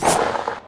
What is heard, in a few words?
huntress shoot; Risk of Rain